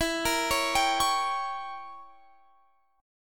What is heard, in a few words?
E Diminished 7th E, Guitar Standard; Edim7 arpeggiated; JGuitar